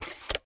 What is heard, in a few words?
Console Close; MiSide